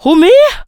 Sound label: HOO ME, Funky Vocals, SHOUTS; USB Soundscan vol.18